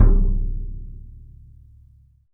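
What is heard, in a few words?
RESONATING KICK; 3D Drums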